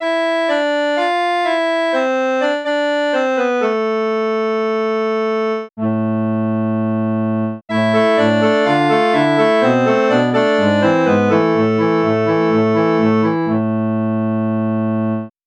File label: reed organ